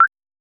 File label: gentle blip sound very br